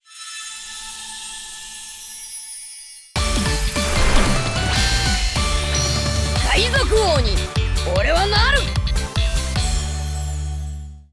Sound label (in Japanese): BGM&SE; キャラ選出画面